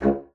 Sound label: C#2, bassoon; Xsample VOL-6